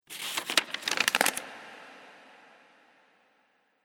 Monastry Manuscript Paper; Paper Sound Effects

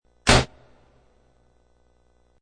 Single quick metal door slam.; Small Metal Door Slams

